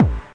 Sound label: house Kick; House Kit; STYLE KITS (Hip Hop, Etc); Choosy House Kit